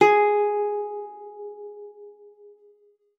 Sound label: G#4, GUITAR; Xsample VOL-2